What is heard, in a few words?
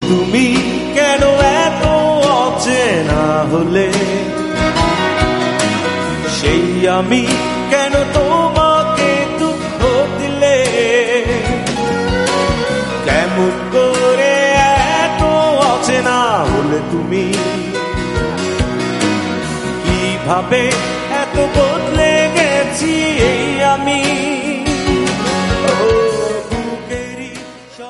romantic, Bangla